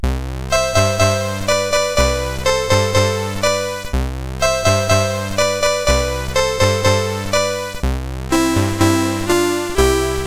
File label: CSG8580; CSG8580R5